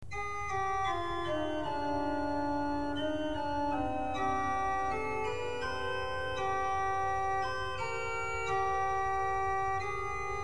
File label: sesquialtera